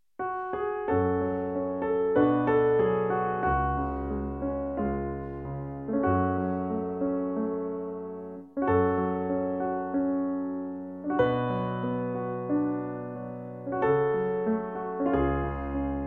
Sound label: PIANO; concierto musica i eso